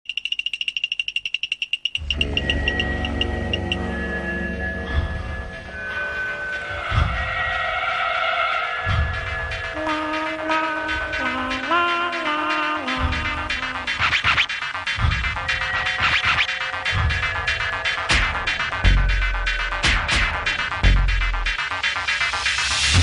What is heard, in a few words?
spooky beats loud clown remix; horror